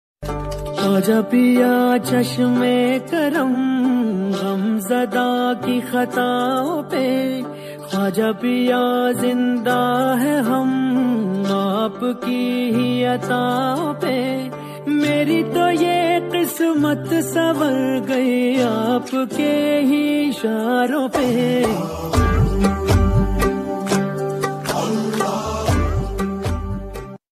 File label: islamic ringtone